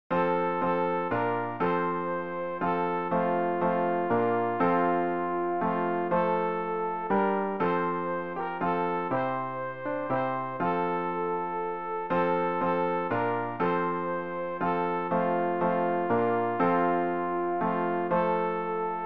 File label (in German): sopran; Ref. Gesangbuch; RG 652: In dir ist Freude